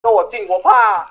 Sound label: scared